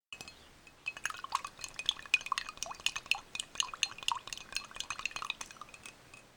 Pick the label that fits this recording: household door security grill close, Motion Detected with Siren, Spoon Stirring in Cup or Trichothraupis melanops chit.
Spoon Stirring in Cup